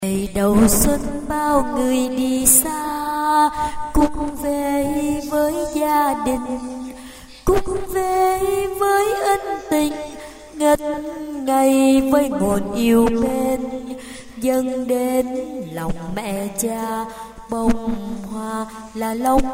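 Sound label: soprano alto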